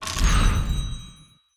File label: spin start